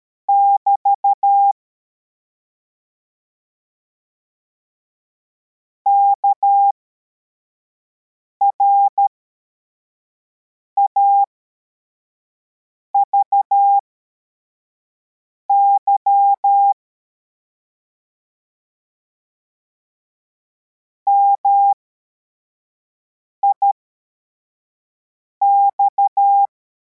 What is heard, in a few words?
cw, morse